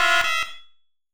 Access Denied

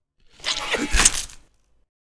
Neck Snap

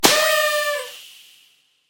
Cake appear

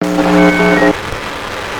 Signal Lost

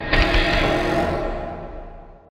Ambient

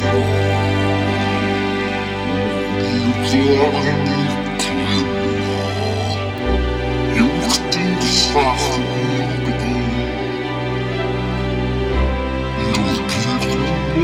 cursed song

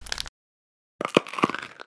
Candy Crunch

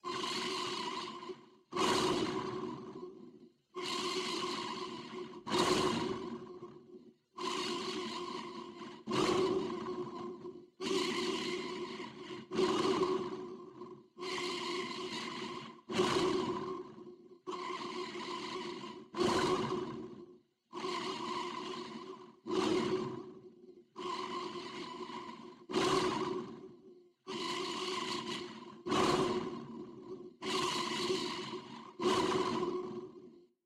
Idle Breathing

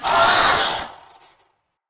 Pain

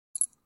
Dry Fire

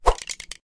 swing

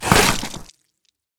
Slash Hit

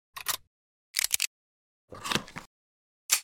reload

